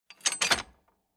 Rattling Old Metal Movements Mechanical Creeking Rickety; Tom Hutchings